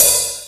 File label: KGT1, Korg-T1, Drums